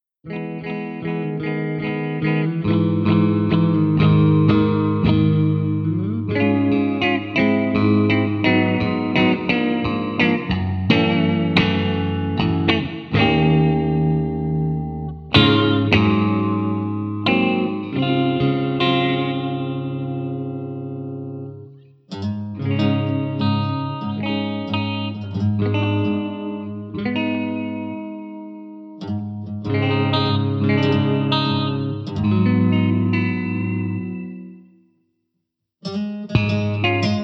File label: viola